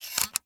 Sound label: shotgun shell